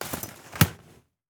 Cane Umbrella, Large, Pop Open, Button, Automatic, Spring Action, Unfold; Sergey Eybog; Automatic Umbrellas